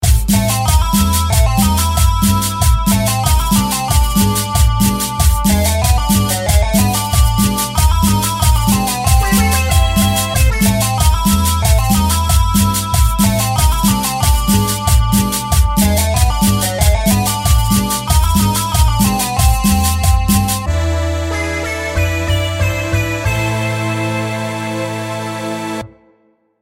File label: Cumbia; Donkey Kong